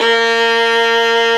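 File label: Violin Legato A#2; Digital Sound Factory Virtuoso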